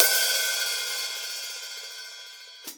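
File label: open hi-hat, Drums Acoustic; AKAI; 90s sample CD; USB Soundscan vol.10; RAW KIT 9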